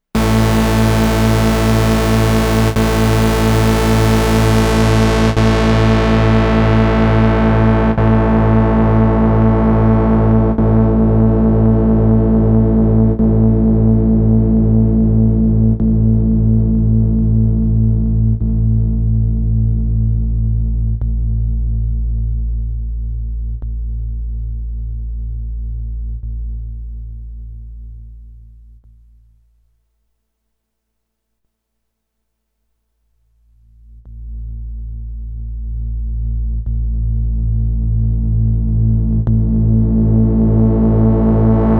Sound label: Korg Volca Bass Filter